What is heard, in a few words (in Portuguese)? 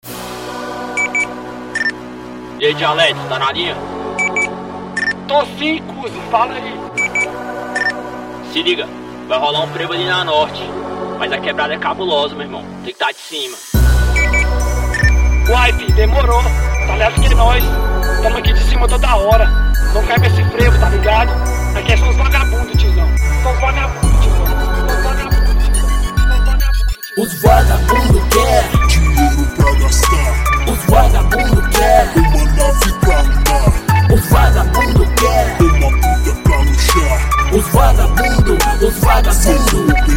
dialeto rap